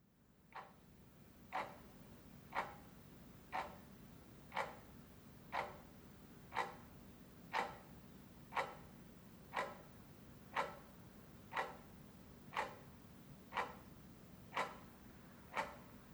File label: kitchen clock